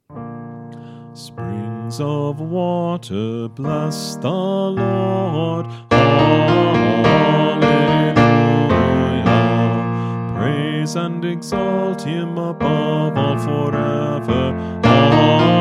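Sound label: Choir Practice Tracks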